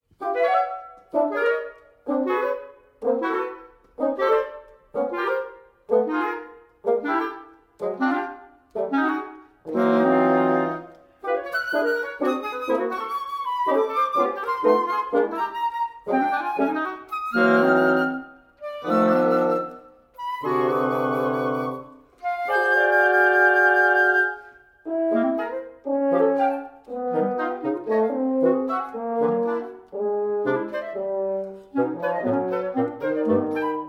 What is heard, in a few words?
wind quintet, allegro